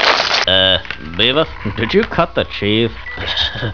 SMACK; butthead; shambler

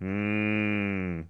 mmmm; Wired for Sound Pro CD; Aristosoft, Inc.; homer

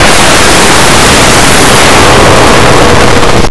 rack; Blue Heat

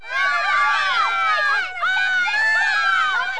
crowd; Interactive Media Design Review 1999

